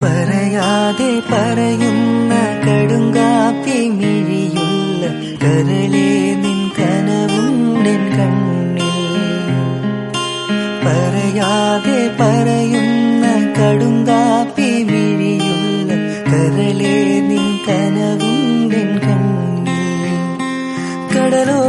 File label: bgm, instrumental; kadumkappi